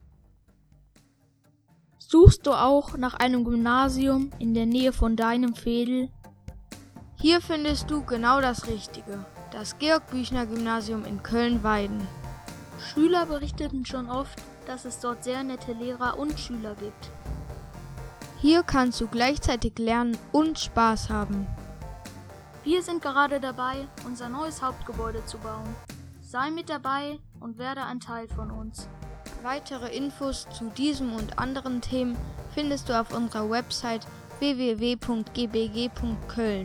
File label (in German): Musik; GBG Werbespots